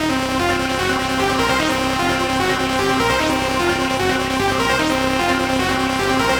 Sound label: HOOKS 150; ANA COLONY; Masterbits - Tekkno Trax